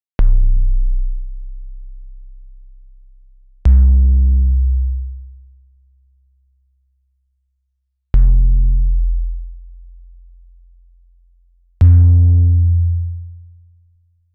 DEEP SEQ BASS Arturia Modular V3

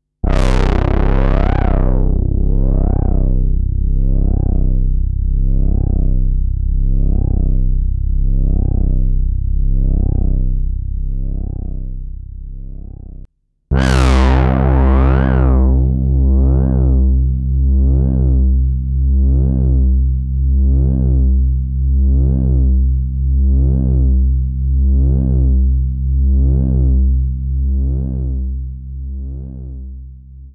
WEAK SYNC PAD Moog Model 15 iOS

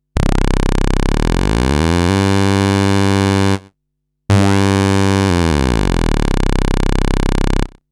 PASS THE LOW FUNK TO THE HIGH Moog Model 15 iOS

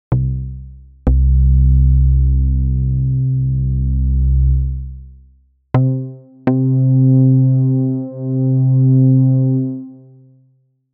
BASS Arturia Modular V3

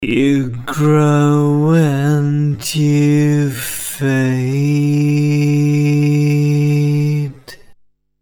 Timestretching 250 Quality 0

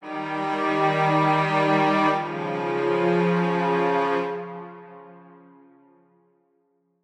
Kontakt Strings

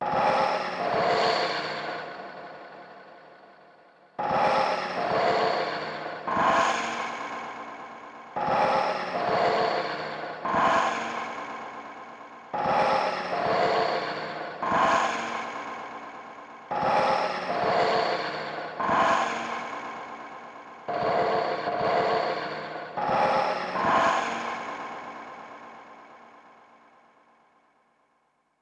ASR10 Turning Atmo

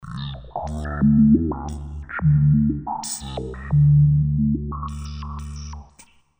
Resonant Filter; WAVeBOY Industries

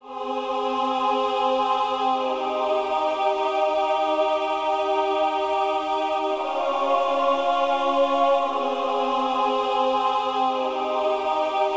Kontakt Symphony of voices

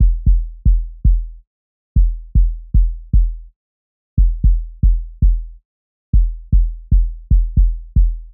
Sub Kick; Battery3

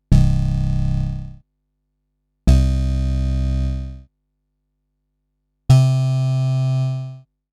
WHUMP BASS Moog Model 15 iOS

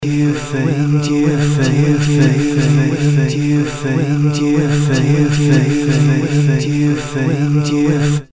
Synthesized Loop Medium

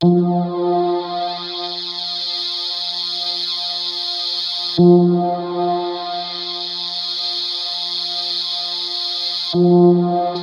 ASR10 Tick Wave

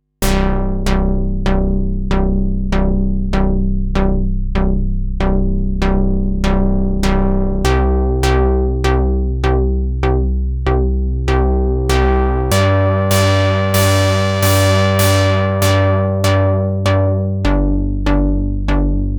NOISE BURST Model 15

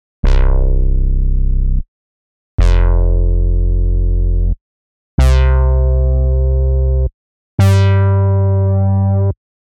FAT SPLAT BASS Arturia Modular V3

